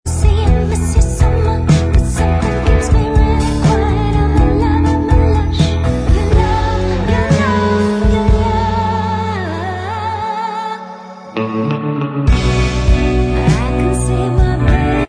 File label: backing vox